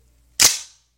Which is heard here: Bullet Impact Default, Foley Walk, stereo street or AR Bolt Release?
AR Bolt Release